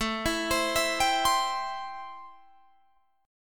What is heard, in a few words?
A-Minor 7th A Guitar Drop D